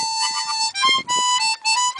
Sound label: Harmonica